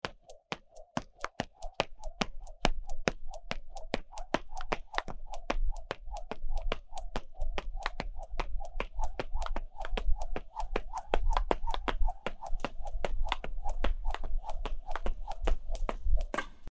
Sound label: skipping rope